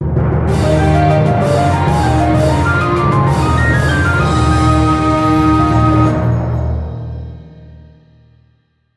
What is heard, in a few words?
jingle win; magic scroll tactics; ps4